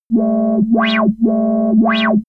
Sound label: modulated wobble; alarm; soundpool